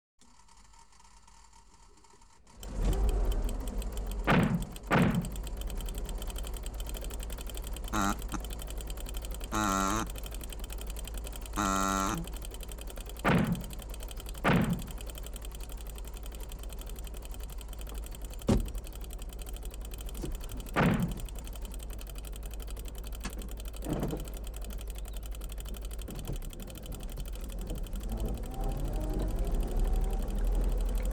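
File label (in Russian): jalopy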